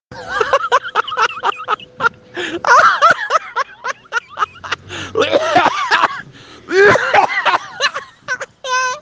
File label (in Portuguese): risada gargalhada roflmao